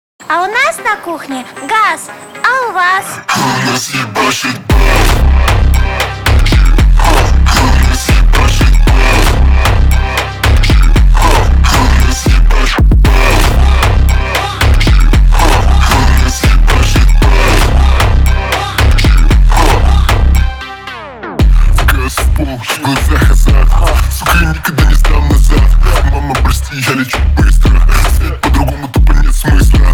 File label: Bass Brazilian Phonk Remix